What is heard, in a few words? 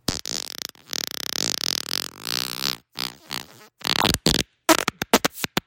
farts